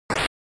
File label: SNES RPG Damage